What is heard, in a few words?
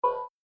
Error